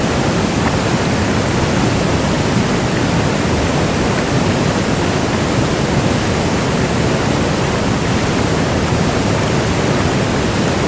waterfall; ze valley orchid

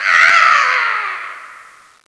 fly zombie death

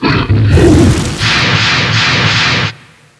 fireball; revenant; zombie giant

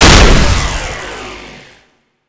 lightning; lightningar-2

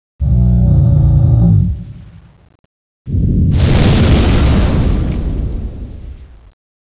groggy start; CSO KrakenBoss

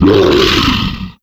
wolf die; wolfteam

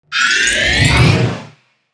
halo gun reload, weapons

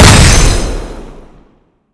halogun exp

